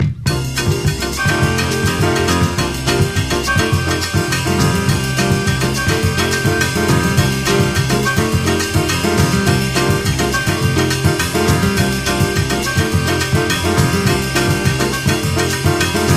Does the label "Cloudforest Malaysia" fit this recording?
No